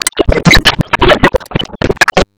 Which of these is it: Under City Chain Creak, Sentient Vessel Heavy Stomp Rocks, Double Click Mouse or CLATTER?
Sentient Vessel Heavy Stomp Rocks